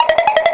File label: Roulette loop